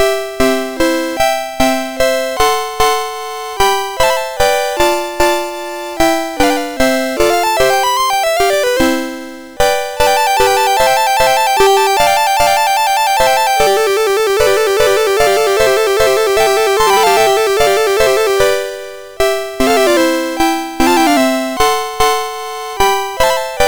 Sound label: Menuet in C, MOS6581R3